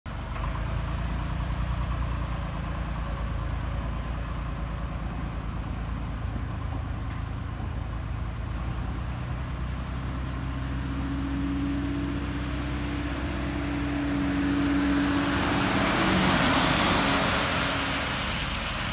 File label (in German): Abfahrt Bauzug Nordstrecke